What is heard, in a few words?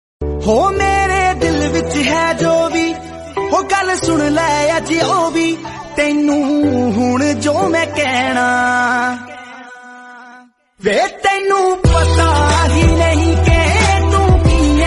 theme punjabi romantic